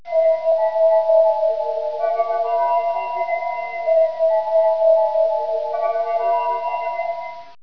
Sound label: space sound